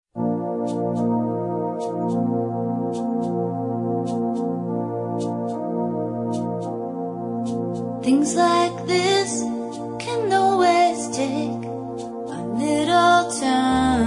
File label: Rock Romantico